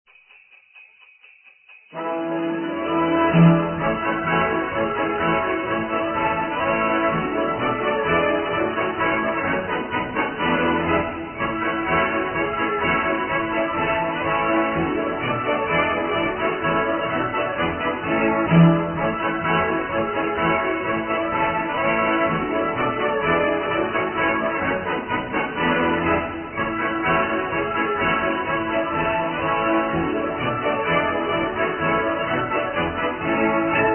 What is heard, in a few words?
Jingle Bells